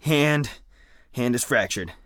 broke hand; Escape from Tarkov; usec2

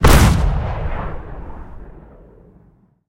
aa fire big; guns; War Thunder

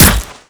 padding small

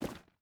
footstep boots stones run; Rust

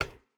footstep hide metal run; Rust

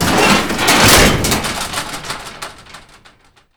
door garage metal roll close

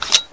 Inf Weapons Foley 3rd; Red Orchestra 41-45

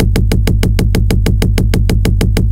loop mk103 38 bass; guns; tanks; War Thunder

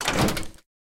rocket reload start, tanks; War Thunder

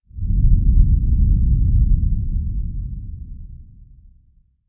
earthquake rumble; sub earthquake rumble; Modern Warfare

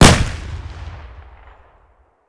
toz fire distant; Escape from Tarkov

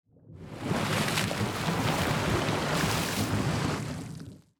submarines engines; World Of Warships

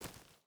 footstep barefoot gravel walk; Rust

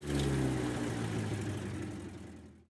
engines; World of Tanks

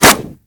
bullet canvas, weapons impact; Call of Duty Modern Warfare

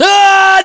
melee charge; english; voiceovers

